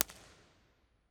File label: baptist nashville far close; 1st baptist nashville